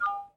Nokia 1112 Batt Low

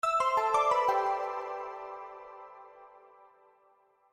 Cute Modern Ring

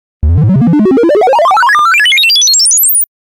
8Bit Teleport

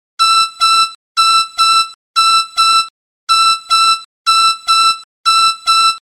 Alarm Sound

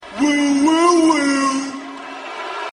Woo Woo Woo

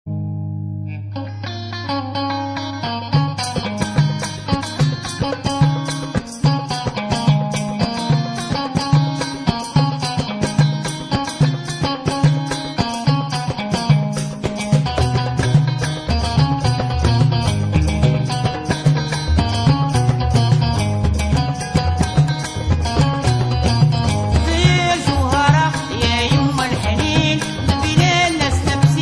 algerian tune

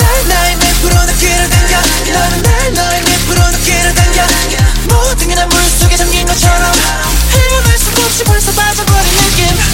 Chorus; Alligator

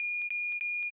Tone Alert; APX

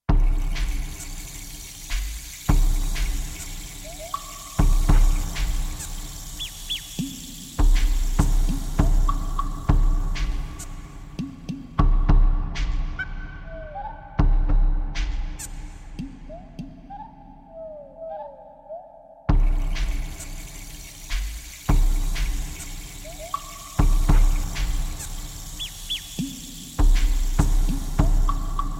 Sounds Of The Jungle

